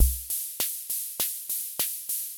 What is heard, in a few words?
basic jazz; Retronome – A Versatile Analog Drum Machine for My Hammond Organ